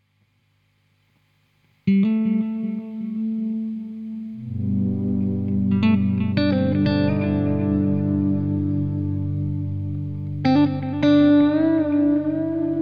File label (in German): ohne Begleitung session